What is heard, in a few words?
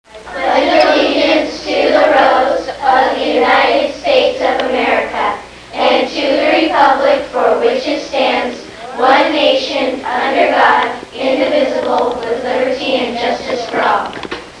flag